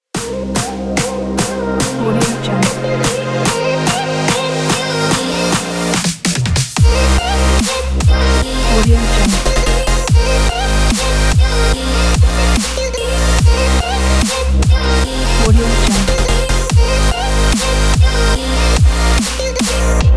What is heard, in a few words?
dubstep commercial jingle